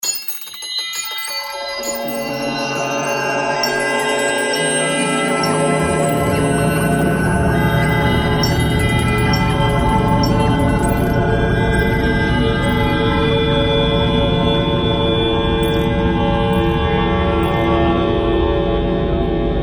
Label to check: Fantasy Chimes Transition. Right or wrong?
Right